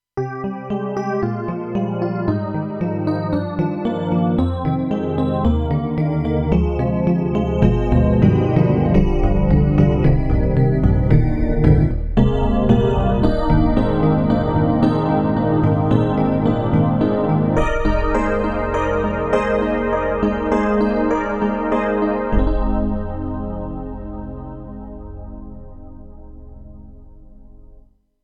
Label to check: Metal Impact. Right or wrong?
Wrong